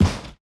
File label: Skeleton Percussion Shot; Cymatics - Astro